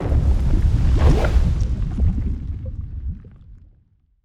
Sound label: molten planet; ambient; Stellaris